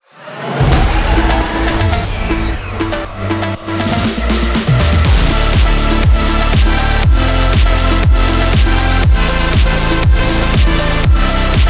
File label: BGM